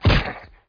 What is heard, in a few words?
cent arch hit; Zeus